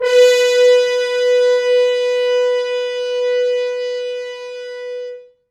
Horns stops

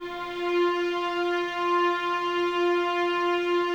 VNS F 4, Violins, String Ensembles; Miroslav Vitous

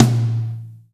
NATURAL M, DRY+FAT KIT

